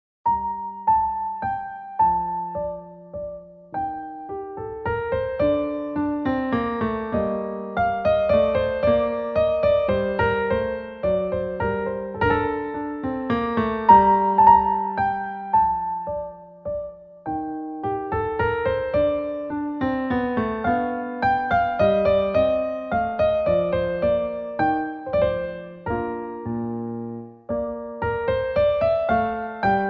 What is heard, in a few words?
Bach Minuet G Minor